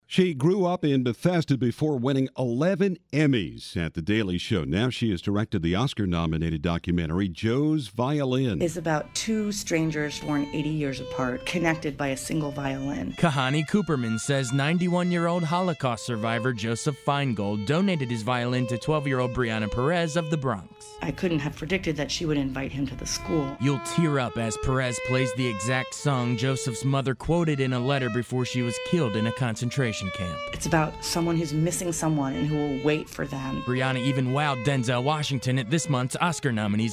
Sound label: violin on air